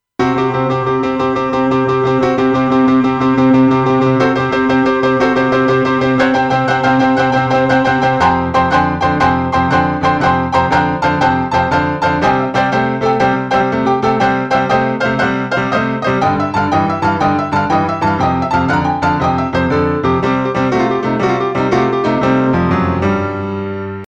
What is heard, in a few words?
Piano Tube; Universal Audio 710 Twin-Finity